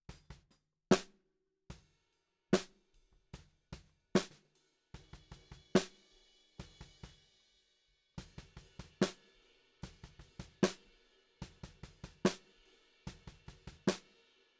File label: Snare Bot